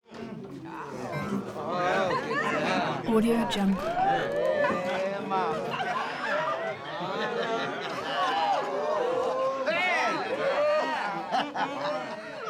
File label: juke joint crowd reacting to music whooping; prosoundfx